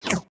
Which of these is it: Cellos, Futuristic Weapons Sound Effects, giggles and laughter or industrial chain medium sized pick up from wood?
Futuristic Weapons Sound Effects